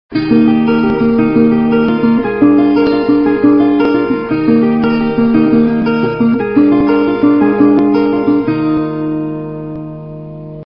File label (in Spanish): viola